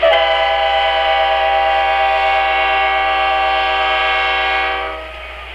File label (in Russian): zvuk privetstviya drugogo lokomotiva